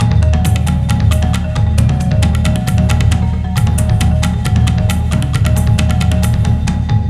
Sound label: Percussion Combos; M-Audio Pro Sessions; Abstract World Fusion 2